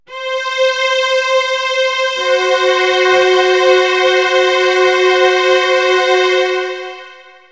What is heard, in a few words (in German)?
Tritonus